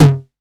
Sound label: 909, DIST; Wizoo - Platinum 24 Electronic; 909 DIST P1